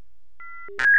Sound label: dialup